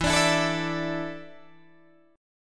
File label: E 7th Suspended 4th, Guitar, DADGAD